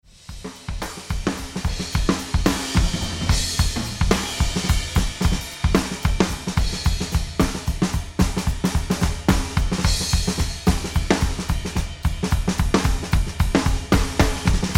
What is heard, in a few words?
Drums Kit Mic Room All